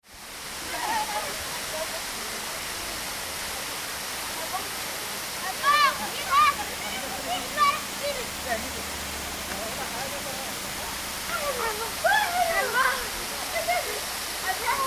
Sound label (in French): tiznit parcours; resonances oasiennes